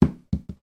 household string ball drop on carpet floor; sound effects one

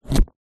food carrot slice knife chopping board noise; zapsplat

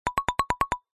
cartoon flutter eyelids shake

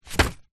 foley paper airplane land hit surface

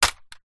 impacts plastic vhs cassette tape

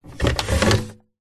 household hairdryer remove from metal holder; zapsplat

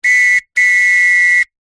whistle two tone blow x2 close up

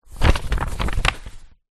paper several sheets movements; foley

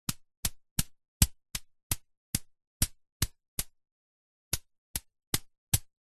hacky sack footbag kicks volley multiple

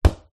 sport soccer ball bounce in small internal space

